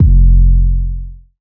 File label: 808 F; Cymatics; Oracle Sample Pack; 808s